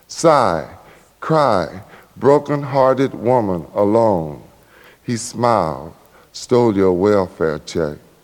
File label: Sigh cry broken